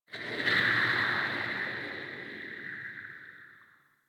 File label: interior cave texture rock movement; Ambience; Misc Indoor; DE; Warframe; Infested Eris